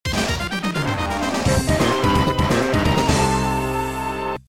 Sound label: round start